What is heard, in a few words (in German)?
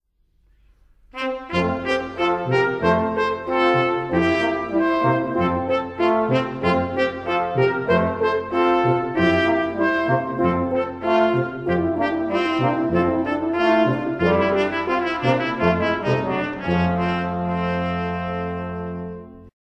Blech; Der Mueller hat ein Muehlenhaus; Lohmann